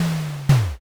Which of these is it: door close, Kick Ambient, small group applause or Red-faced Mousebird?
door close